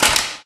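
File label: physics clipboard impact small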